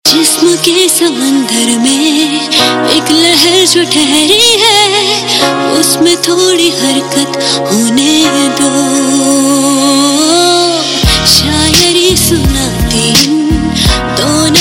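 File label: Mashup Cover Ringtone, Punjabi Pop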